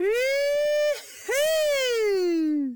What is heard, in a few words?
Man Woohoo; Zomboid; Lifestyle